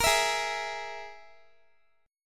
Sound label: G sharp Suspended 2nd Sharp 5th, Guitar; strummed